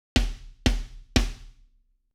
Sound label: Kit Original